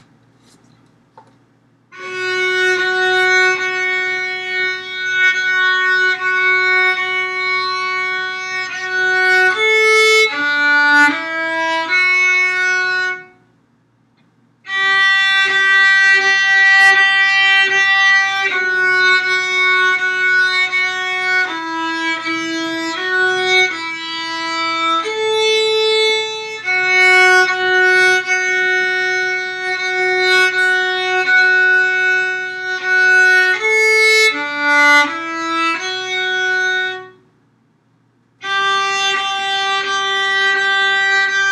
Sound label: Jingle Bells slow practice part